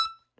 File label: Hohner Special20 Vib rel E5, Harmonica, Releases; Aerophones; Free Aerophones